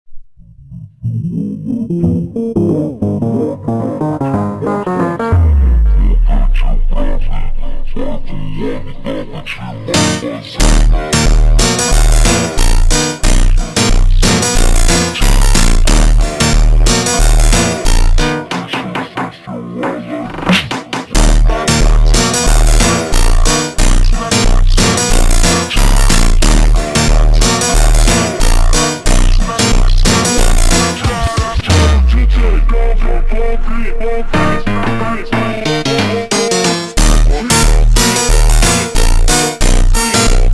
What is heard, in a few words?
Montagem Batida ultra Slowed